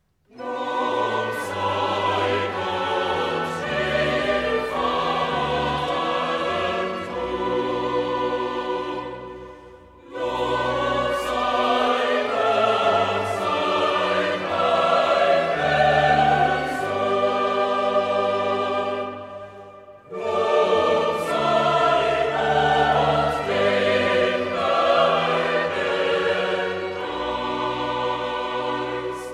choral; schwingt freudig euch empor; bwv 36; lob sei gott dem vater ton

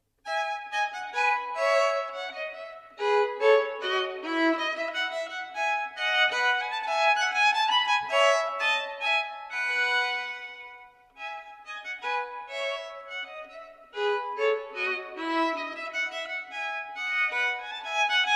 partita iii e dur bwv 1006 menuet i

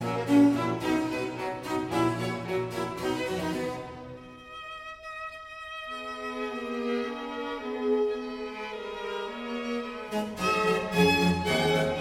concerto g dur wq 169 fuer traversfloete streicher und basso continuo allegro; allegro di molto

